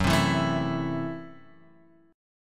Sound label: F sharp Minor 7th Flat 5th; F#m7b5 Chord {2 3 2 2 X 0} strummed